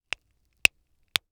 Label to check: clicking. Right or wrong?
Wrong